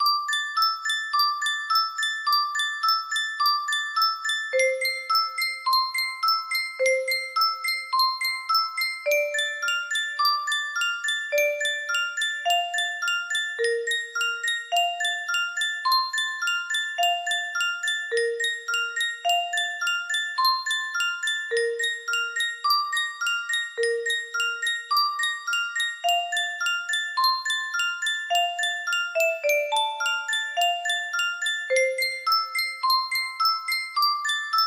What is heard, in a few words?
steel guitar